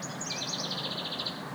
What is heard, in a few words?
bird out window amplified